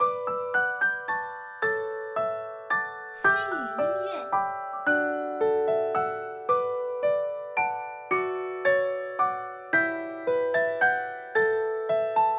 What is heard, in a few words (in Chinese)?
舒缓 轻柔 钢琴